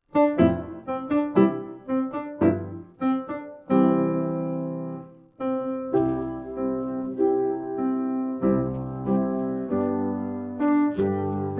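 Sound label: speech therapy vocal warm-up, backing track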